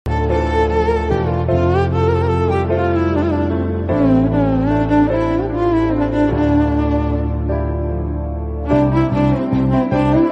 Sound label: love bgm ringtone south indian bgm ringtone tamil ringtone famous tamil bgm tone